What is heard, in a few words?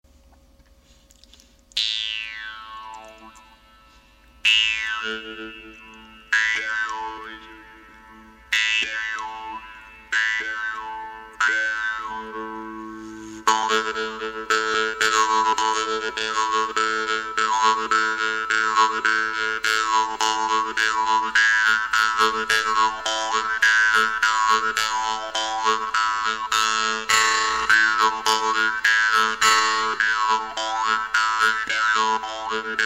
jews harp variations